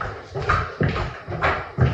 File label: flap flap flap